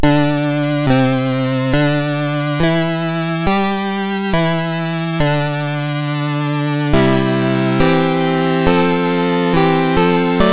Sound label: Japan, National Anthems; MOS6581R3; Dave Marquis